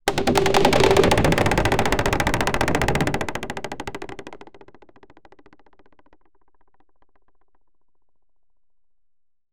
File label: the drop sound effect, wooden